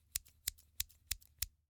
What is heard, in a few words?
Scissors, snap, snip, shear, small, snips; Eiravaein Works; Vaeyan IV